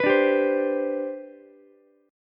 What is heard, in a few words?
C Major 7th Suspended 2nd C down Ukulele, chord; CM7sus2 strummed; JGuitar